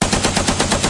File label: 50cal